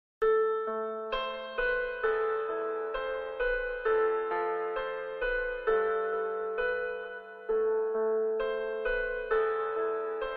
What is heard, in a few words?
hip hop made in favela bass